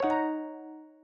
Im Highlight Msg; KDE

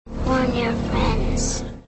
Ring In

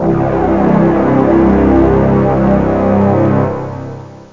orch brass; OctaMED SoundStudio V1; mirage

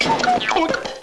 cuckoo

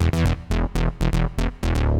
fuzz; Software Explosion 3 (Fore-Matt Home Computing)

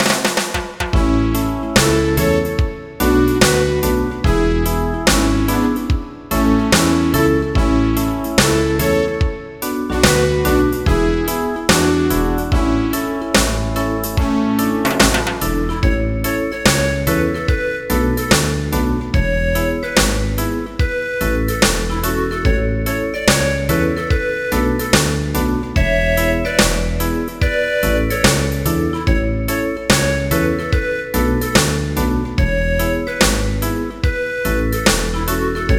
REGGAE

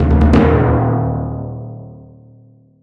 timpani; Alexander

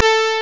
tip syn

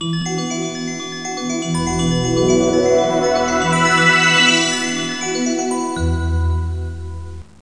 pile, money

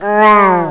grow

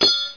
drop metal; Heretic II

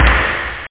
snare tear; OctaMED SoundStudio V1; drumkit; snaredrums

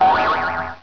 IDLE, robots

